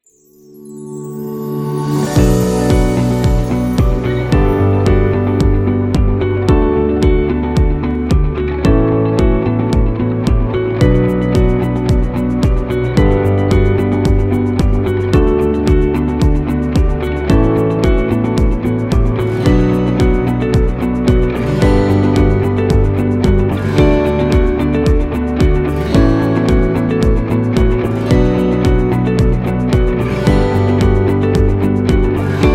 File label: Motivational Medical, corporate music; corporate music vol 10